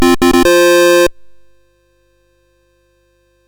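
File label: Mountain King, MOS 6581 SID chip music, games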